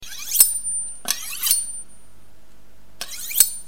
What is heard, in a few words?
knife sms; ringtones